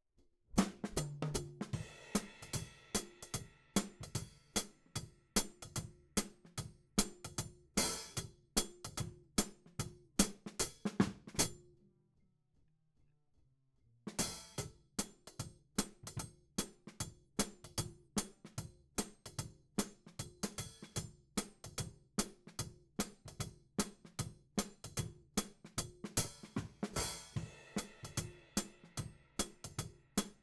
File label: Hat